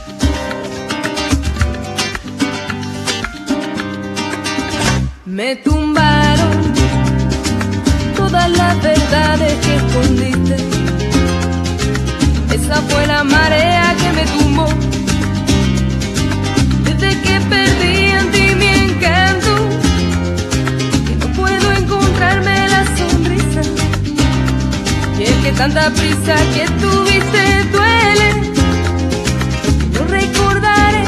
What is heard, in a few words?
Flamenco Chill